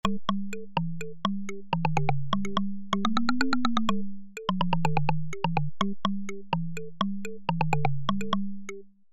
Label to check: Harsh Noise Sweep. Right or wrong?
Wrong